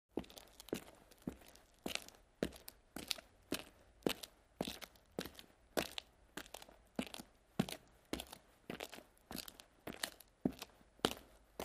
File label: Footsteps On Cement